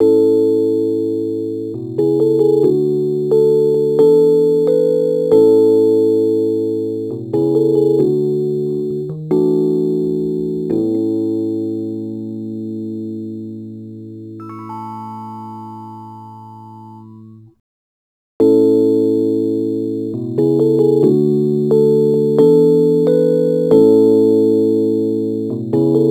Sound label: Rhodes Attacks; Ueberschall